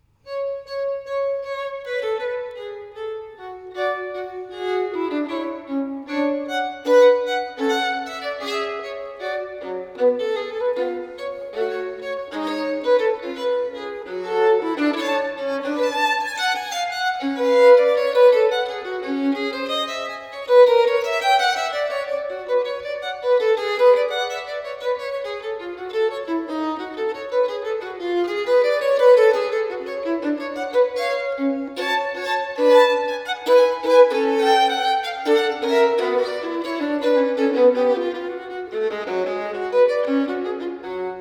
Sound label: Sonate nr.1 in G minor BWV 1001, Violin Solo; Bach; Sonatas & Partitas for Violin Solo